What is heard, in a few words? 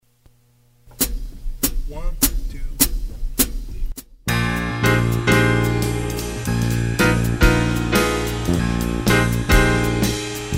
with drums